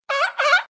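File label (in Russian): звук тюленя короткий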